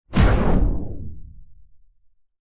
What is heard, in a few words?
sound design cinematic hit crack bolt impact into a descending slow tail; zapsplat